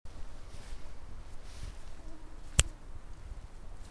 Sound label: Mosquito Snap